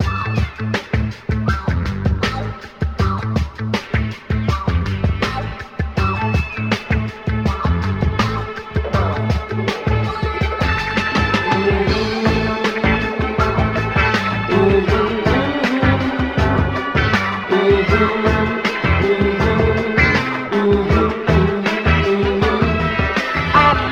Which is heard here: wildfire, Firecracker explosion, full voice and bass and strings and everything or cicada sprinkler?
full voice and bass and strings and everything